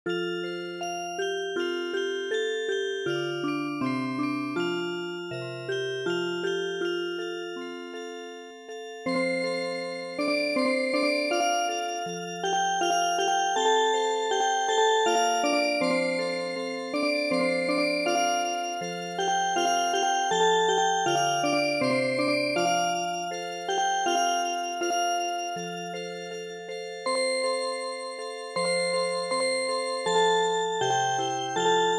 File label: Music Box